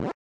Squish!; List of Wrecking Crew '98 media

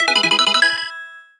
Stylish Move

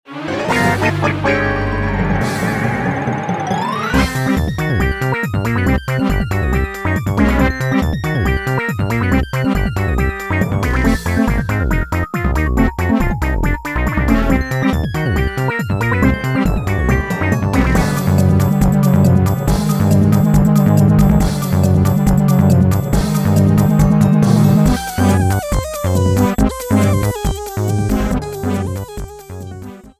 Battle Theme

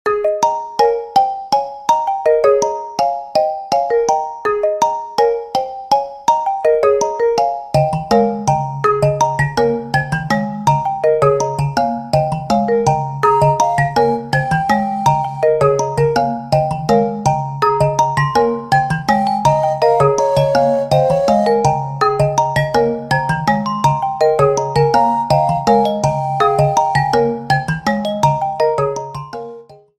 "Village Square" theme

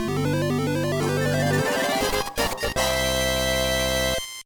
"You Win" theme; MPA

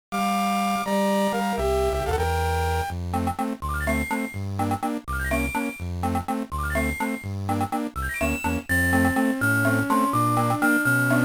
underwater theme; SMA2

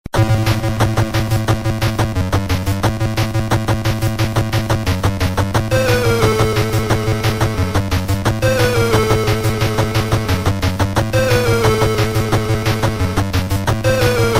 Clash; DK'94

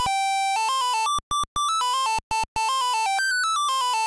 Jingle; G&W; DKJR

